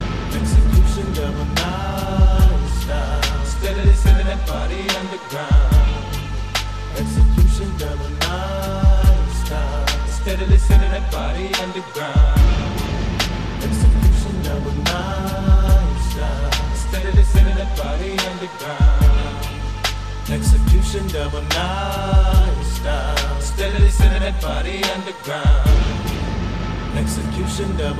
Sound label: new hip hop